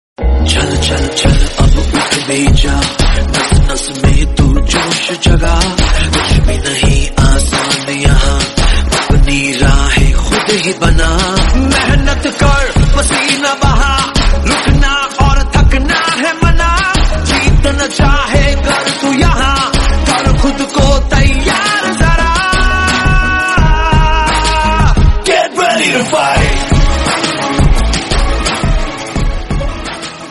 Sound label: Student Motivational Song